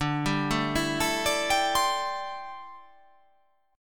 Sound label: D 7th Suspended 2nd Suspended 4th D